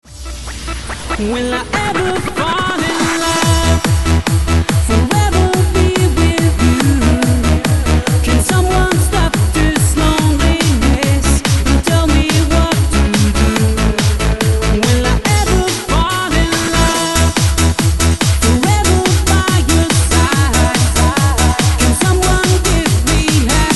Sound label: Bass